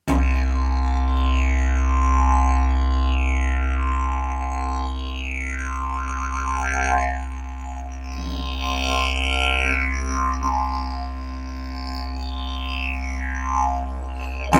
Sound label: didge